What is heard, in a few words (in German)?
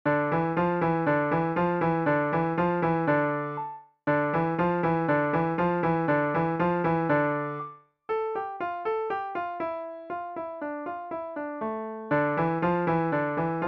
Piano e Forte Klav